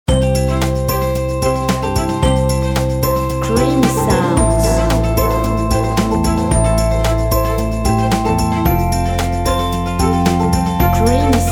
creation musicale noel

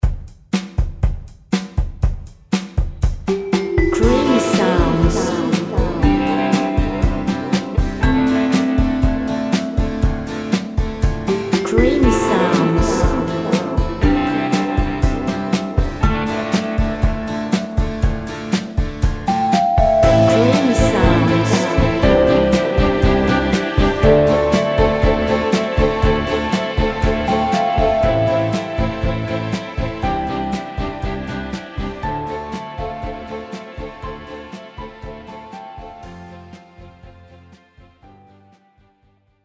creation musicale folk institutionnel

